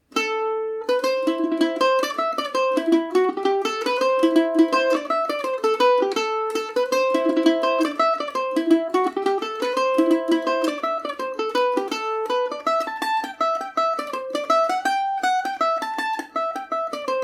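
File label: A dorian paris swing, irish mandolin; scotch mary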